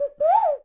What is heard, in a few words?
bird calls, percussion